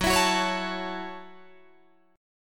F sharp Minor 7th F sharp, Guitar DADGAD

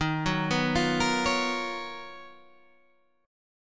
B Major Sharp 11th

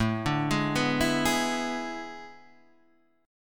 A Major 7th Suspended 2nd Suspended 4th; AM7sus2sus4 Chord {5 5 6 4 5 4} arpeggiated

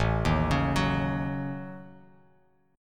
A 6th Flat 5th, Bass Standard; A6b5; arpeggiated; JGuitar

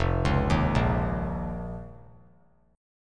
F 7th Suspended 4th Sharp 5th F down; F7sus4#5; arpeggiated; JGuitar

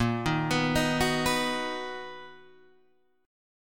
B Major A sharp; B/A#

